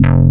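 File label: analog bass F1, analog synth; AKAI S6000 CD-ROM - Volume 1; SAMPLE WAVE MIXING 1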